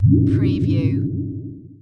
Amb process

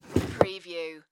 Jewelry Box Drawer; Sound Effects Bible

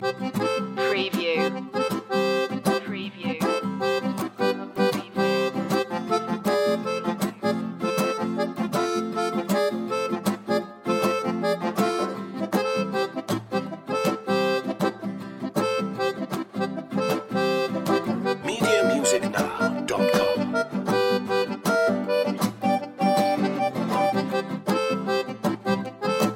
zydeco loop

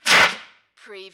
Fire Extinguisher Short

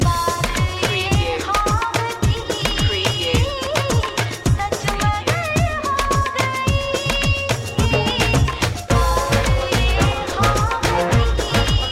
eastern romance

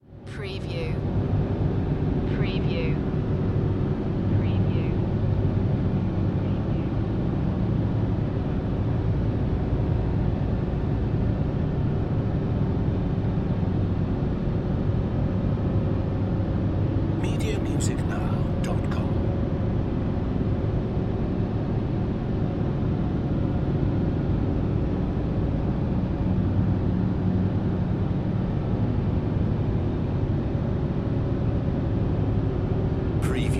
Factory Room Tone

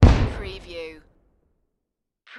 Firework Boom Bang

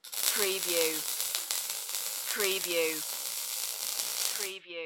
Weld Torch Single

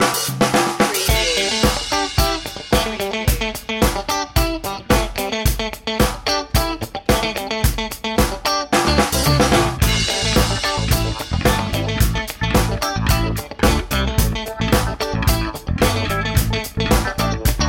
funk groove

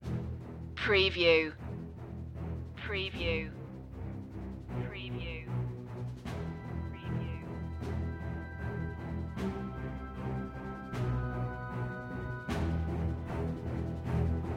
no choir

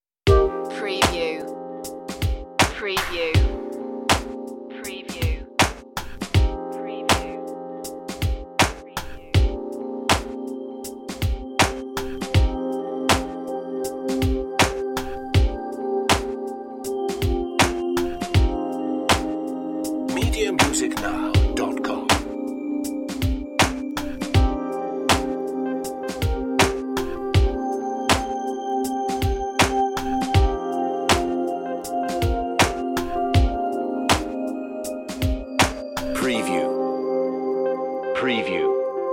rnb texture underscore version

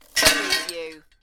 Metal Junk With Chain Clanks Rattle

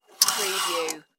cloth hanger slide

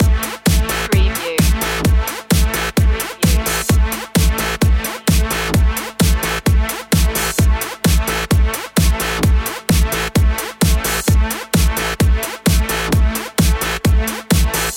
flying chords loop

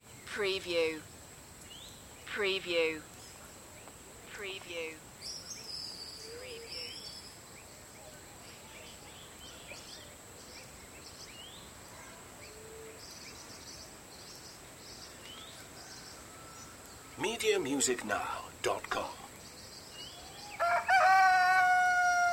cockcrows and birds

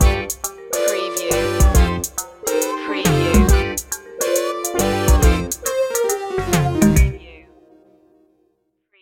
retro video game blues stinger

